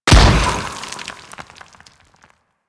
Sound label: Tree Slam Explosion; Enemies; Abilities; Warframe; Teralyst